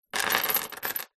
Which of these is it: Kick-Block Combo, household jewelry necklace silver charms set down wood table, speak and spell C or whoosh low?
household jewelry necklace silver charms set down wood table